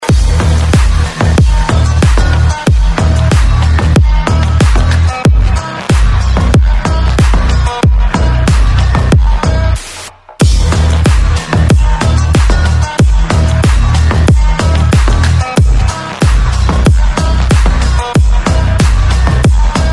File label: bass Boosted